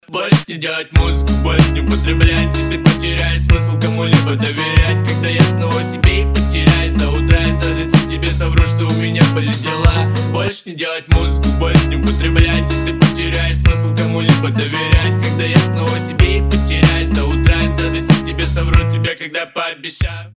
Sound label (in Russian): kishlak muzika